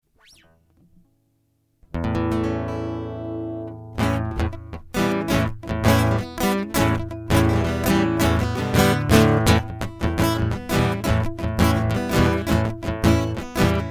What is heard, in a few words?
Guitar Intro; hyper terrestrial; Gold Rush